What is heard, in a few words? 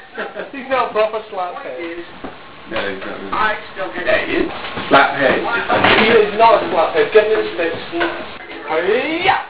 slaphead; SLAP HEAD Game